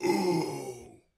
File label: death; jocly; mana